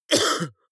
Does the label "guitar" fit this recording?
No